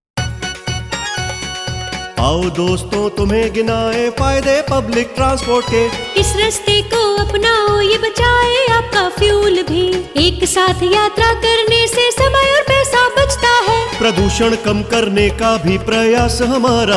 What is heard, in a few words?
public transport jingle